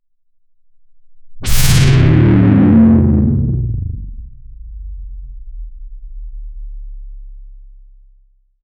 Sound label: futuristic propulsion bur